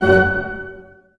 STAC F# PP, orch stac; Sound On Sound - Peter Siedleczek's Orchestra

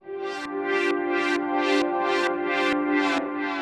132bpm Lines n Loops Synths, uk garage samples; Solipsa

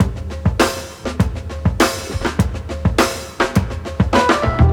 breaks

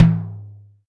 tom lo, Alesis DM5

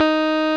Roxichord, E.Piano

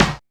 WONK, SNARES; Back In Time Records; Big Bang

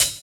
Hhat, Hihat; Equipped Music; Loungin House

